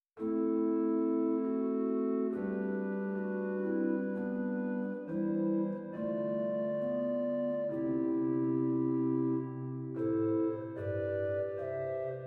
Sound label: Roerfluit 8; zwolle